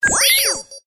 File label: science fiction computer burst beep tone